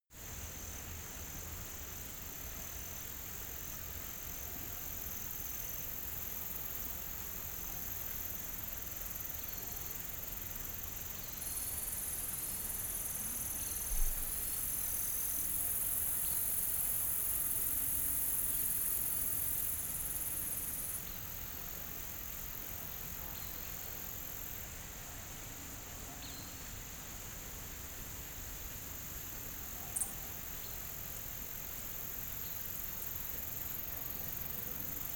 savanna summer afternoon

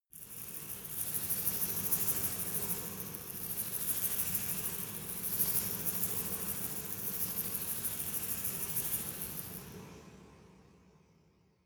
resonator rattle soft

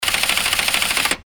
telegraph

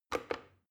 plastic button start click regular fast

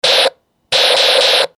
toy gunz

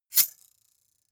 knife slash vibrate

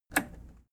plastic button scratch release disable

